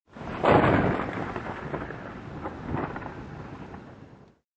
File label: thunder